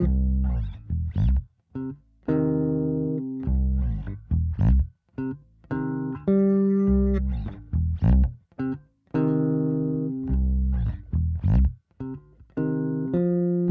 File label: Bass; raji an ancient epic